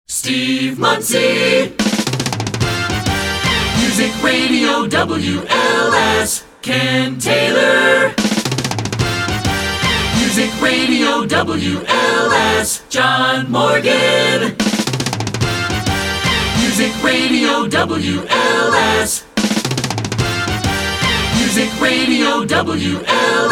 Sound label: Jingle; Short Attention Jingle Show IV